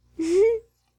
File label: Cuddle